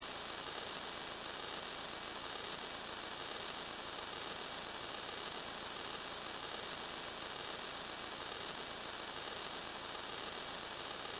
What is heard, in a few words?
Communication Dead, Scifi, Communication, Dead, Air, Static, Loop, Science, Fiction